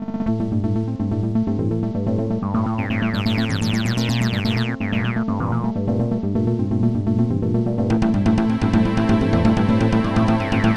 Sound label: Transambience